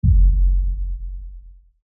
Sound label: Explosion